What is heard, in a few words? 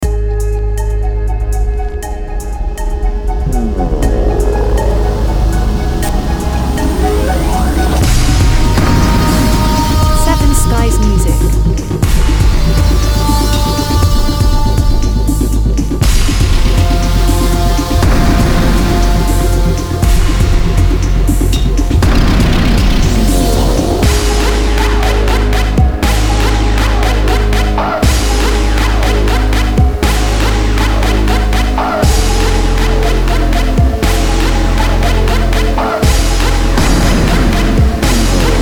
Horror Trap Trailer